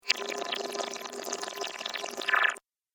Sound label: Water - Backward Bubbles